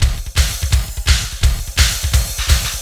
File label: LOOP, Eurotech; Sounds Good